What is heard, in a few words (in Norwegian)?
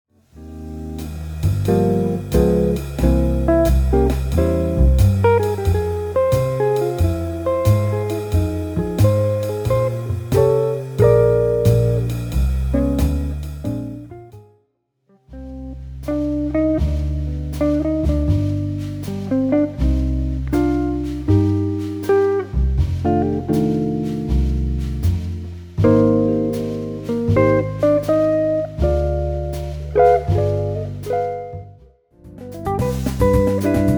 Medley gitar og lydspor